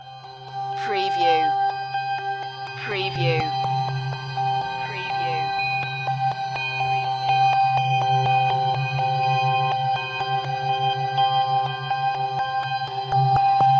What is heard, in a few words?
belldrone